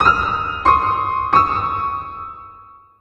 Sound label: hunter bacteria, music; left4dead; Source engine